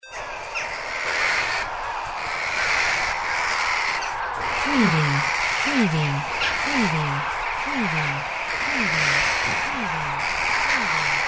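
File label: animal crows murder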